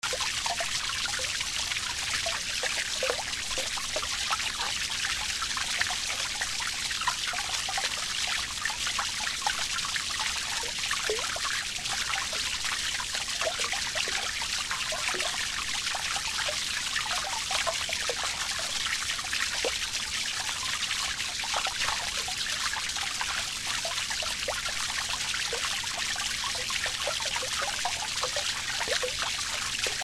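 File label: atmo arizona sedona frog pond waterfall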